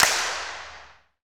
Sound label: PRC RVB C, Clap; Roland L-CD701; Clap & Snap; Clap Modules